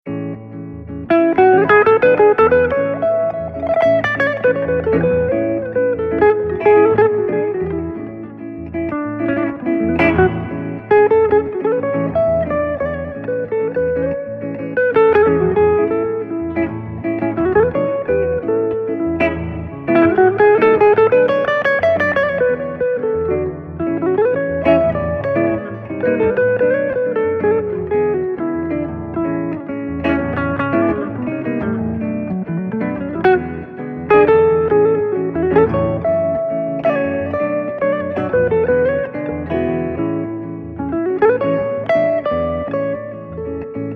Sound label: Bossa in A Major, Guitar